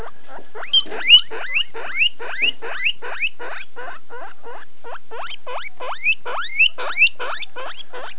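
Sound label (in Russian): звуки издаваемые морской свинкой; zvuki morskih svinok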